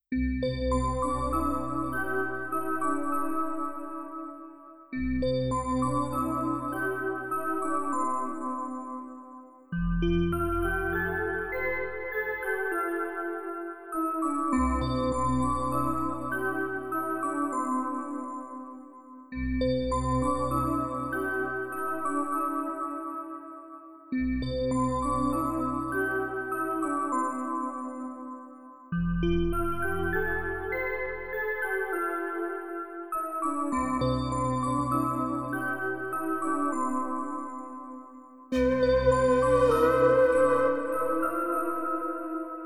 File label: fitness music for stretching 432 Hz